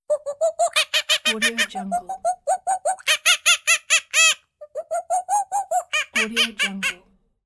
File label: cartoon monkey